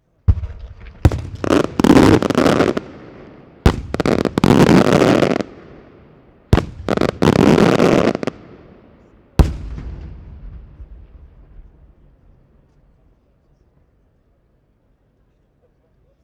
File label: Strong blast with medium crackles, Fireworks Malta; Sound Villain